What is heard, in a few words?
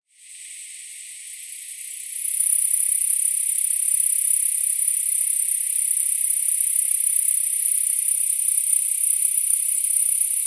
T. orientalis; ヤブキリ